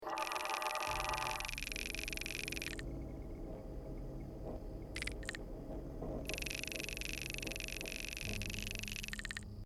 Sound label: insect buzz